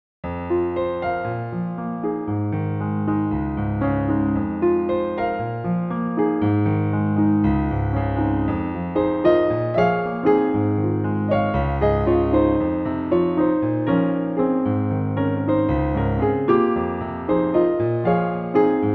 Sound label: pianist